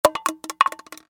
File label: plastic bottle concrete drop